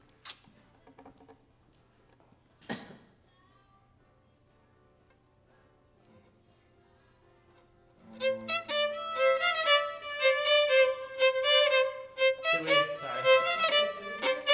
violin

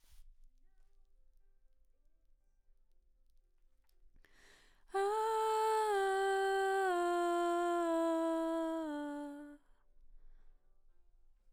BG Vox

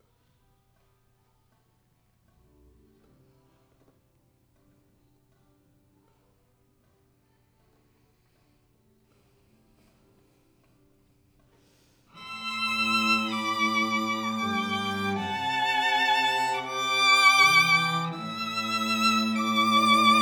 Violin

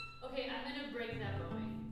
Cello

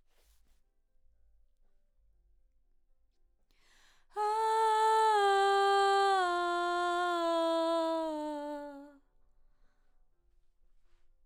BG Vox

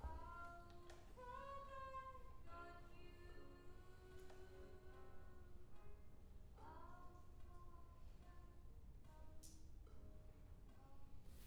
Cello

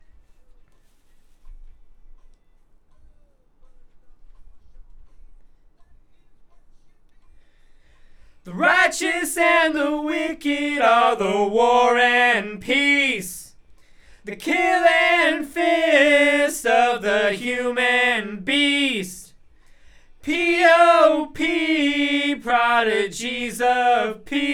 BG Vox

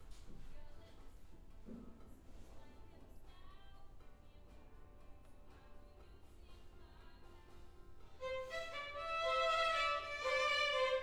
Cello

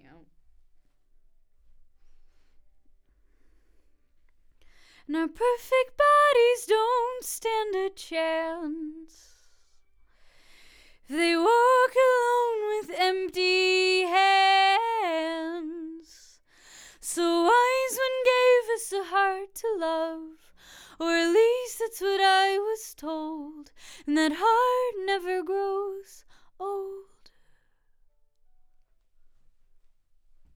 Vox

